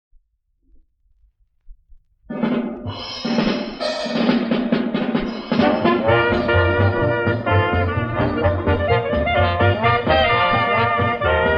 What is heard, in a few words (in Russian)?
orkestr